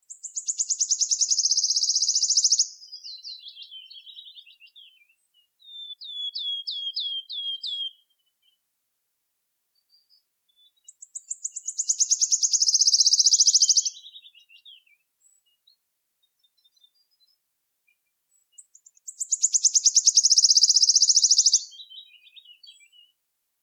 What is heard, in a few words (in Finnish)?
sirittaja; laurinmaki